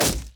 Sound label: Torch Impact; SFX; whittler